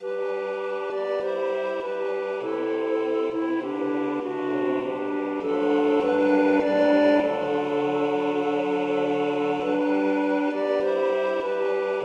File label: polyinstru; hymns; Himnario grande